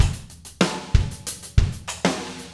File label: 1 bar